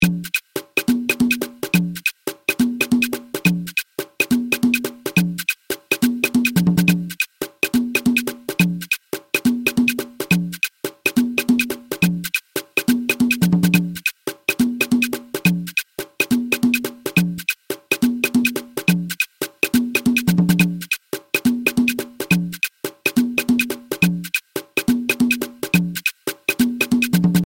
guarapachangueo

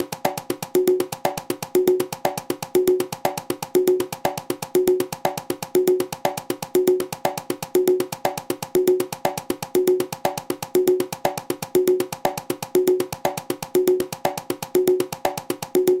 mambo conga

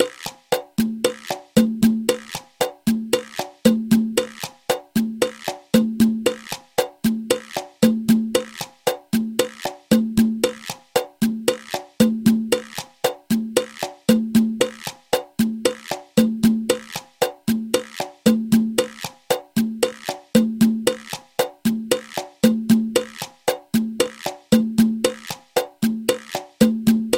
cha cha cha

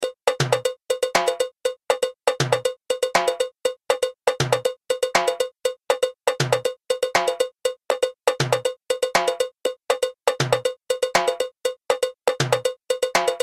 songo timbales

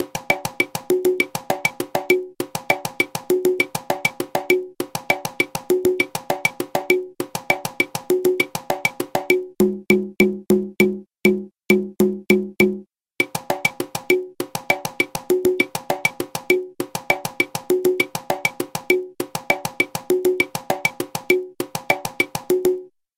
timba cierre

